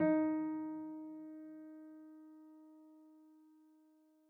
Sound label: piano sampler